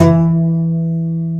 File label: ContraBass Balalaika E3; Planet Earth; Digital Sound Factory Planet Earth